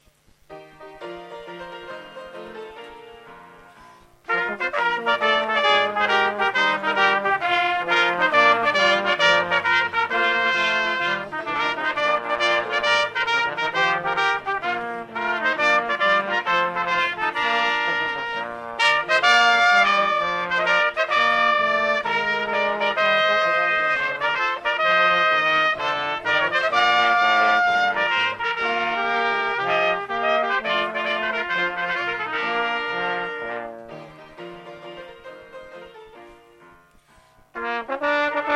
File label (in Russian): В час, когда труба Господня (Духовой)